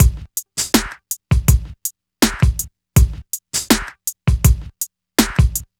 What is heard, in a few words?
Hip Hop and R&B Drumloops; Best Service; ProSamples vol.01; AKAI